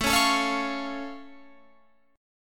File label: A Augmented A down Guitar Open F; strummed; JGuitar